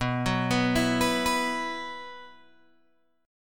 B Suspended 4th, Guitar, Open G

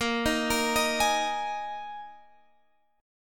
Bb 7th Suspended 4th Bb

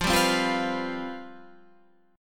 C Major 7th Suspended 4th, F, guitar chord

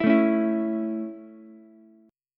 Db Minor Sharp 5th Db Ukulele; strummed; Dbm#5; JGuitar

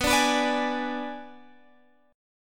B Minor 6th B, Guitar Open G

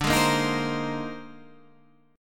A sharp 11th

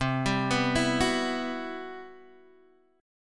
C Major Sharp 11th, Guitar Open G

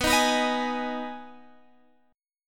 B 6th B down Guitar Half Step Down

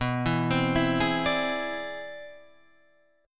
C Augmented Major 9th B

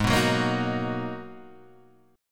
G sharp Minor 9th G sharp, Guitar Standard; G#m9 strummed; 4,2,4,3,4,2; JGuitar

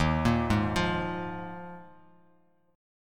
Eb Suspended 2nd Suspended 4th, Bass Standard; Ebsus2sus4 arpeggiated; JGuitar

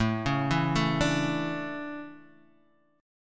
A Major Add 11th A, chord; Aadd11; arpeggiated; JGuitar

